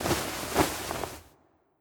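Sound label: Skeleton Scientist Clothes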